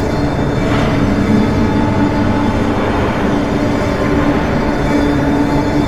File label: spectral loop; LoL; yorick